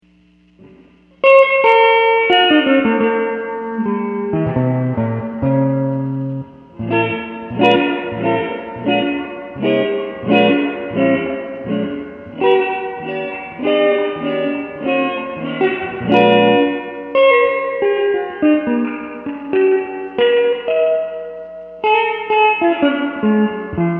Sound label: heavy, amps